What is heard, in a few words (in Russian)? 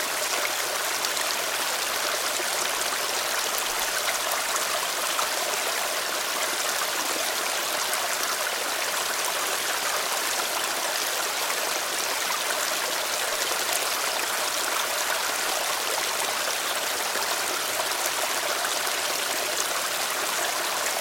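быстро мчащийся поток водопада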